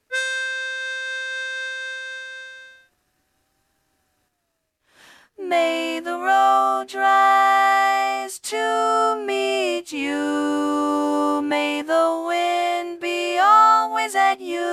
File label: Lead predominant; An Irish Blessing; learning tracks